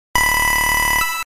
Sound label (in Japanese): コイン; スパンカーの作り方